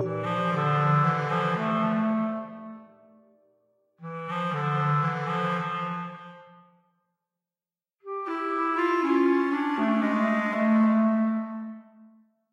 Clarinet; Toki Tori 2

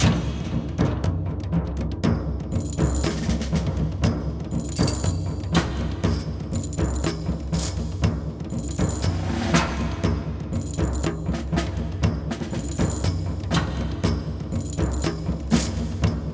Suspense; Stronghold Definitive Edition

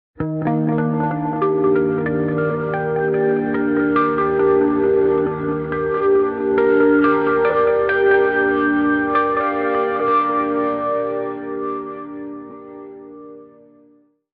G steel; Torchlight III; PS4

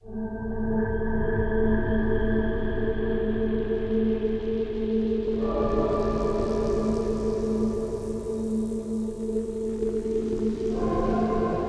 choir

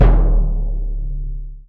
Bd Punchy

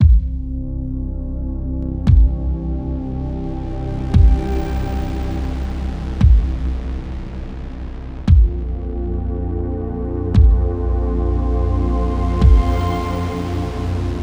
south tower blues lose

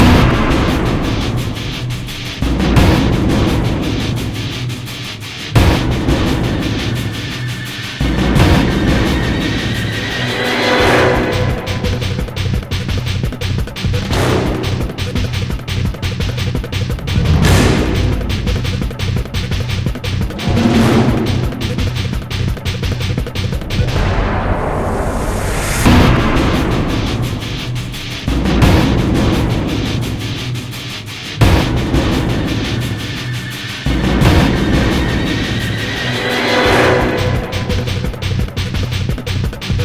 Battle high; bulletstorm ost; ps3 ps4 switch windows xbox 360 xbox one

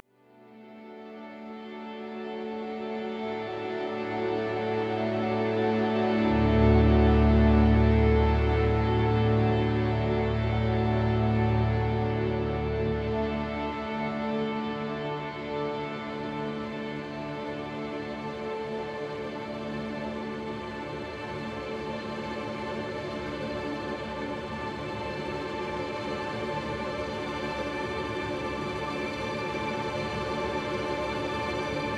Camp Strings E

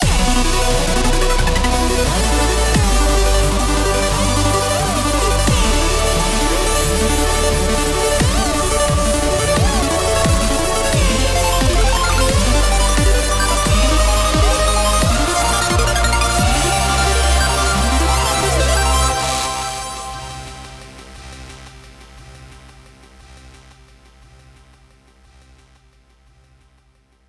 jingle boss win; Pac-Man Championship Edition 2

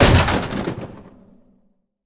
Plastic Heavy; Prison Architect

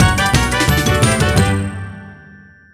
music End Tag; Birthday Party Bash; Wii; Spanish; BDAY Hamster Race

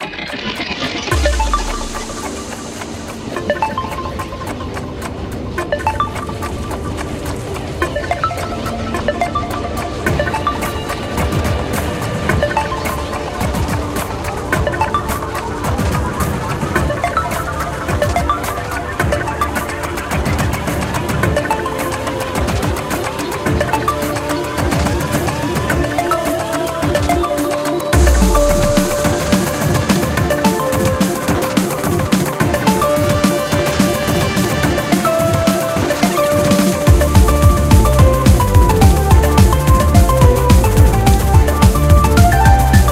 Collapsing Rift (Fast); Super Mario and the Rainbow Stars

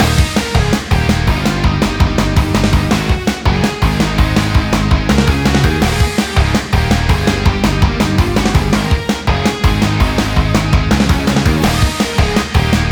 Staff Roll Loop; Staff Roll 02 New

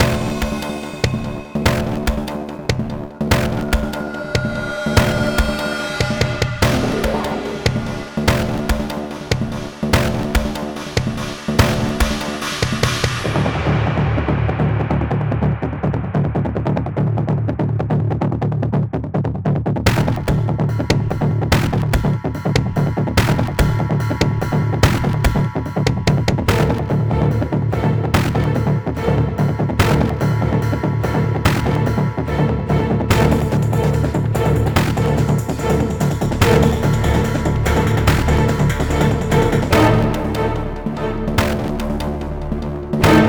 Battle Boss LOOP

